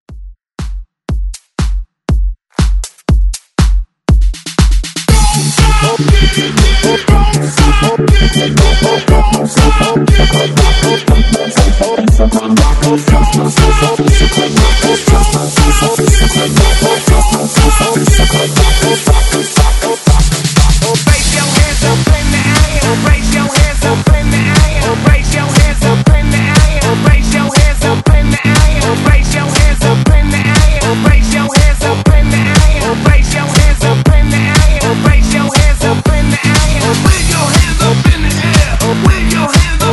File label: Hype 120Bpm; DJDOLLS; Club Rockers